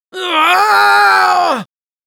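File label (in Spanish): Spy pain critical death, es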